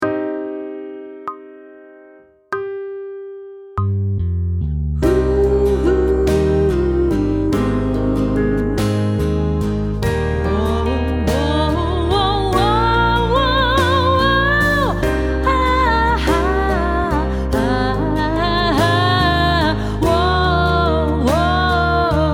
Sight Singing with vocal, pop vocals; Grade 7

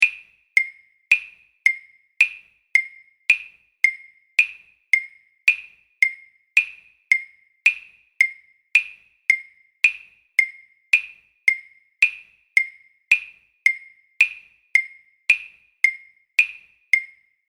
Click, drum kit; Step 1 List B

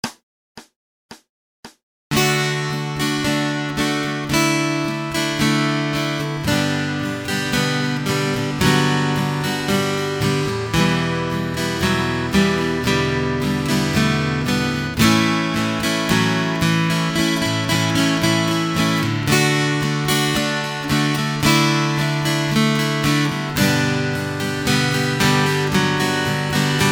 Acoustic Accompaniment, acoustic guitar

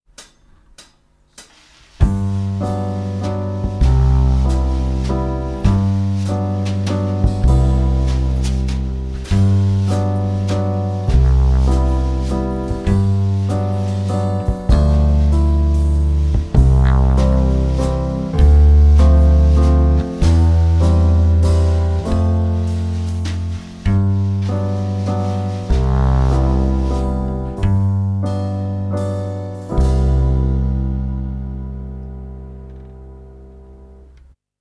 Smooth Waltz (backing only)

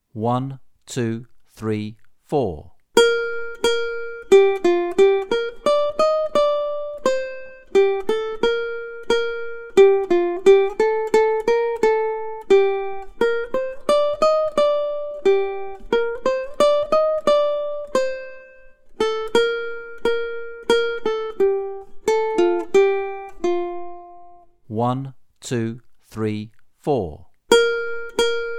Accompaniment, ukulele; grade4

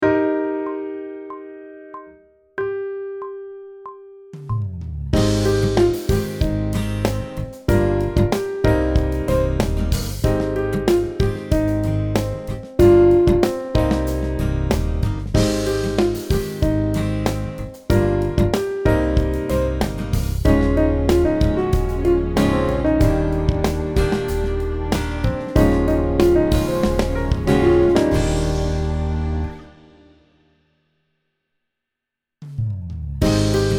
aural tests harm of a melodic phrase; Grade 8; pop vocals

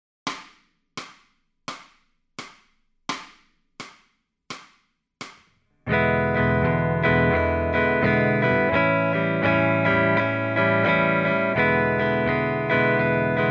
Lead Backing Track, electric guitar

